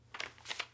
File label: Page turn; Phasmophobia